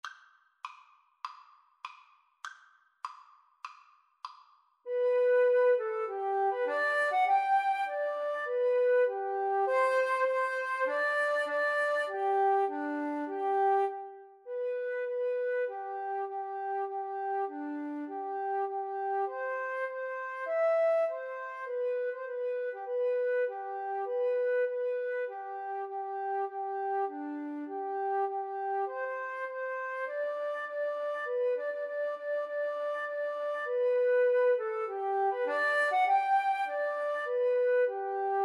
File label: Flute Duet